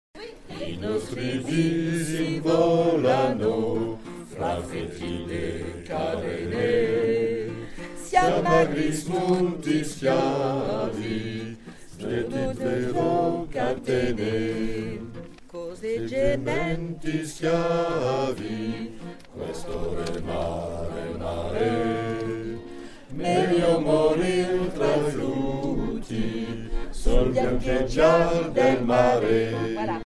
Bass

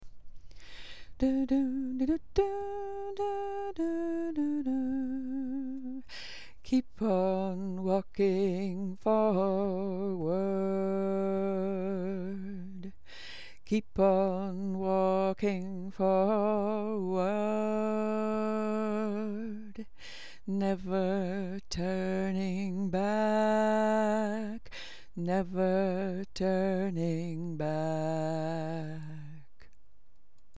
tenor

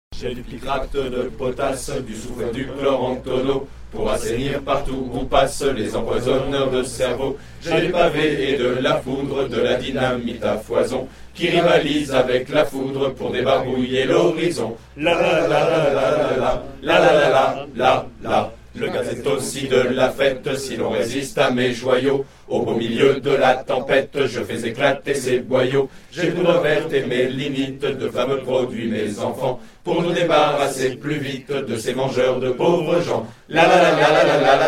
Bass

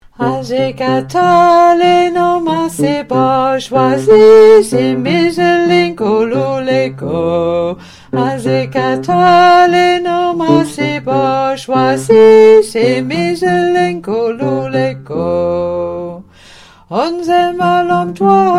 Bass; Asikatali

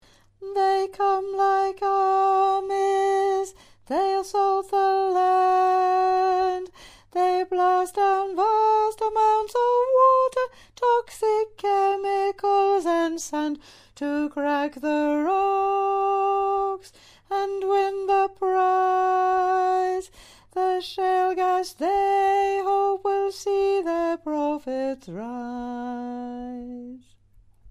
Alto

